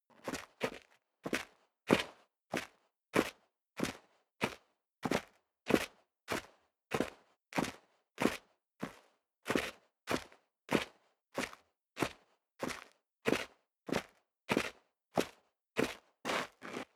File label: step sneaker concrete grit downstairs sequence; schritte